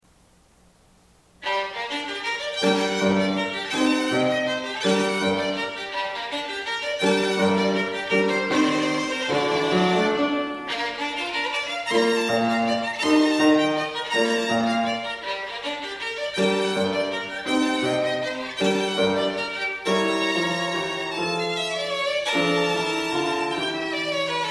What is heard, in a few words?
Violin Sonata in G Minor Allegro LIVE; Tartini